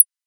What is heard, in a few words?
Borg Hat Closed, drum kit; Polyend; Stazma & Concrete Collage; Concrete-Dual Borg